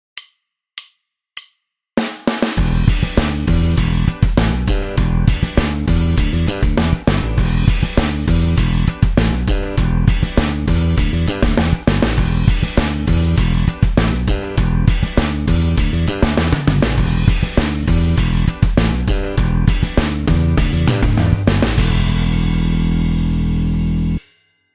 hammers

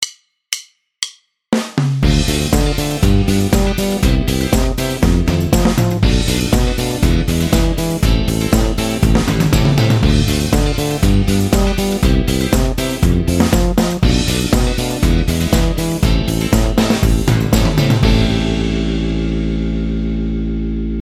octaves exercice, basse; exercices

